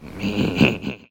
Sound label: laugh